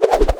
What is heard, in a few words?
fall